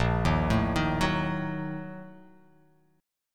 A sharp Major 7th Suspended 4th Sharp 5th

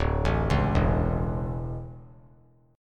D Major 7th Suspended 2nd; arpeggiated; JGuitar

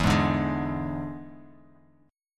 E 7th E down; E7 strummed; JGuitar

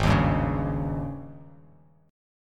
Ab Major 7th Suspended 4th, Bass Standard; strummed; JGuitar

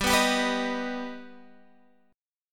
G Augmented G, Guitar Standard; strummed; 15,14,17,16,16,x; down; capo=3

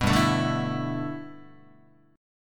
G 6th Add 9th G Guitar, chord; G6add9 strummed

